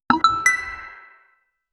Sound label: the emergence of elements